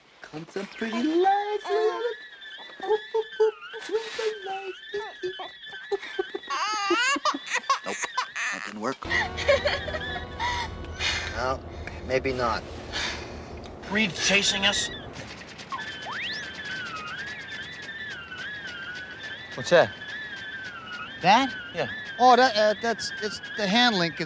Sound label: Warbling Rough Long